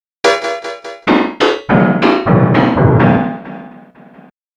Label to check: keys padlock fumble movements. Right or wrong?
Wrong